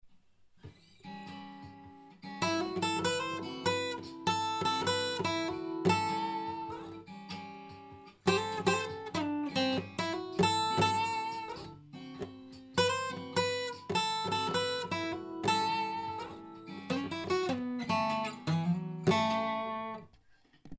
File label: blues